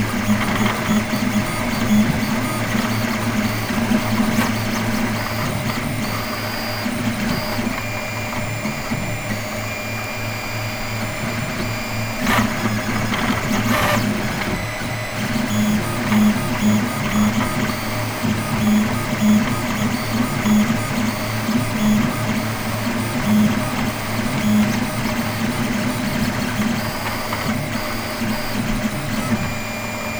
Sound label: 3D Printer